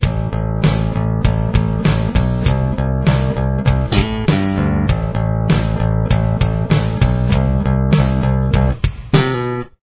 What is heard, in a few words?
chorus bass